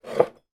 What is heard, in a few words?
household cup mug slide move on table; sound effects one